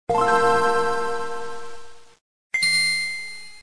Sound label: original game boy advance startup